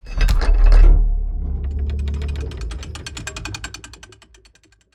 seesaw start; fallguys

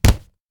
door hit; fallguys

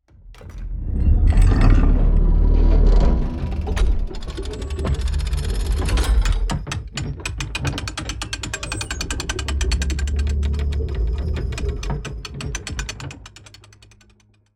turnstile start; fallguys

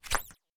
jump plastic; fallguys

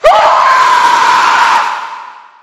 alert; ragemap2023; slasher; slasherghost

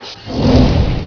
alien

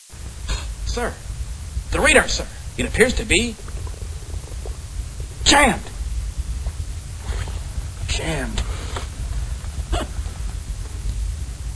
radar; co podrush

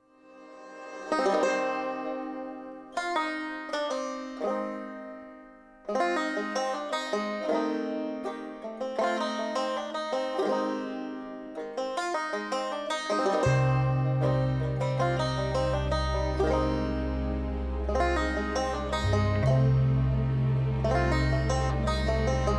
banjo; co moira

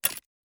fruit appear; fallguys

